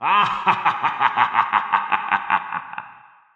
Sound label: evil laugh; SoundsCrate